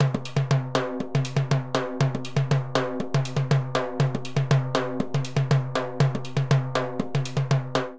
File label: Baion B Timbales-120bpm; Latin Percussion; Ableton; Factory Packs